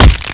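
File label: crowbar hit body; weapons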